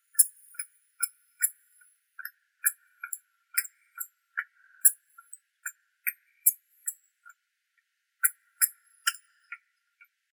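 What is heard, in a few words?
grey hamster, Cricetulus migratorius; homyachok serii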